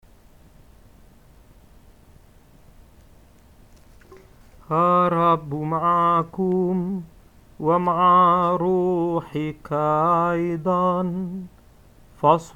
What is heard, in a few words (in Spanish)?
Ritos al Evangelio Cantado en Ar